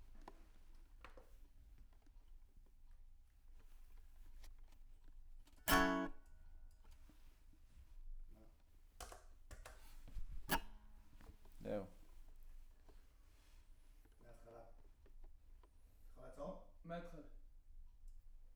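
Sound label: guitar Mic; GTR 1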